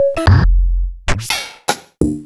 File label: Clavia Nord Modular Kit